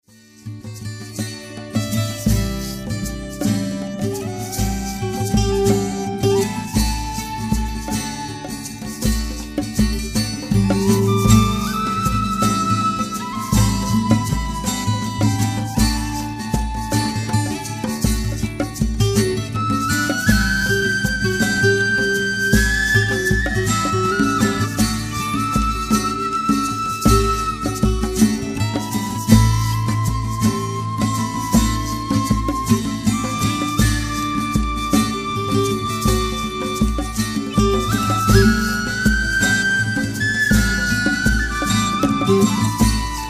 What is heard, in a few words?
whistle